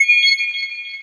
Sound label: bonus